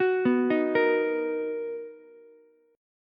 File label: B Major 7th B down Ukulele; arpeggiated; JGuitar